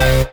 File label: Synth Sound; Vengeance Samples; VEE Synth Shots